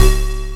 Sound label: Buzz Thing; Digital Sound Factory Xtreme Lead